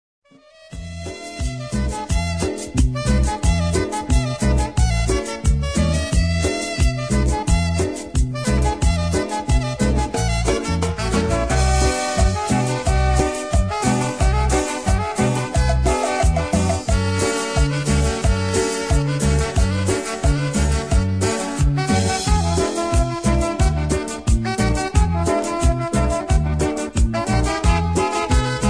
cumbia

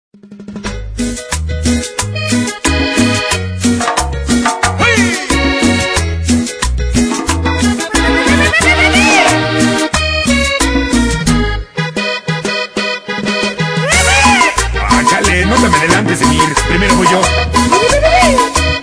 cumbia

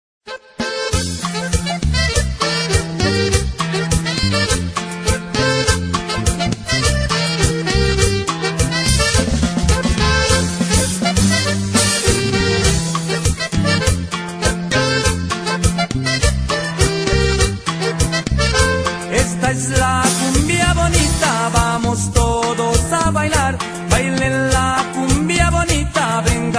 cumbia

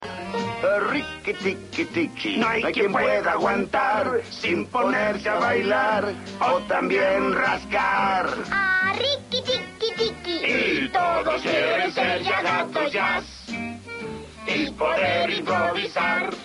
gato jazz

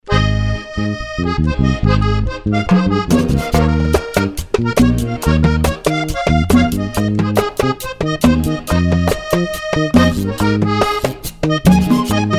vallenato nokia; latino

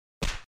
cachetada

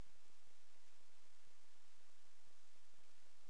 musica, dance

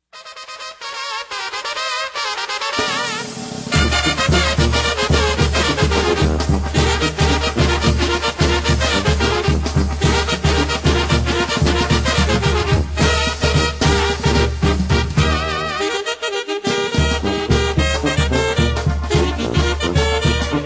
tamborazo zacatecano

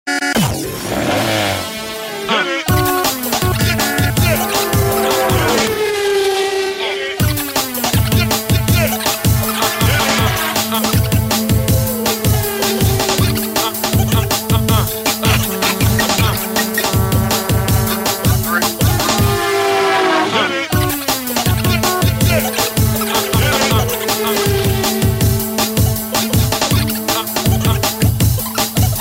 Mix Autopista Electr

